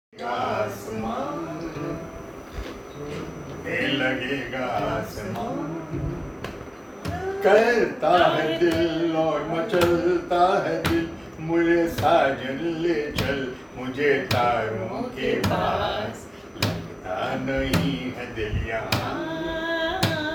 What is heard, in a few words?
hindi music